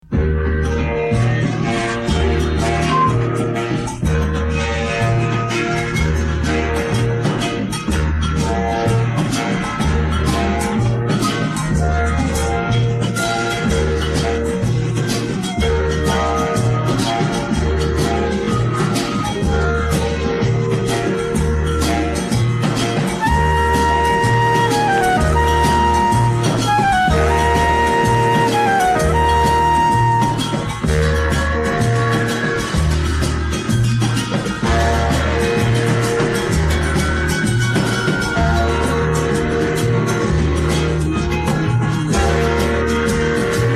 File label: groove jazz